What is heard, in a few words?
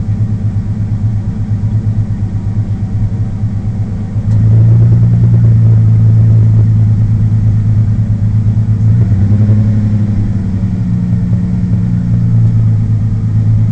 Ranger Flowmaster Magna Cat Parking Lot